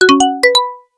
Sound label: Drops, notifications